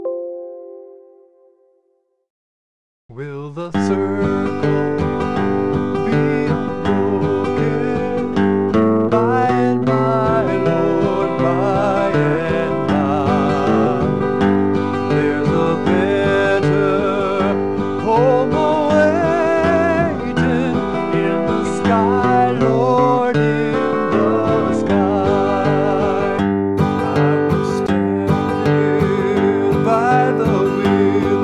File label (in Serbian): Gitara